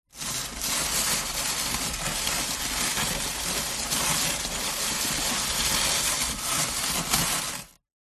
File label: cardboard box drag scrape movement long on gritty hard surface; zapsplat